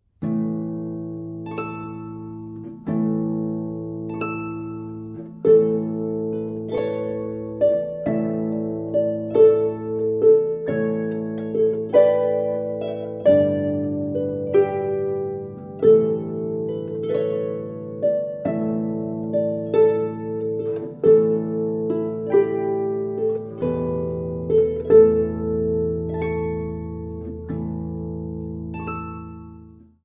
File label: Christ Child Lullaby, celtic harp; xmas